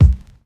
kickdrum b key, Royality free kickdrum sample tuned to the B note. Loudest frequency: 121Hz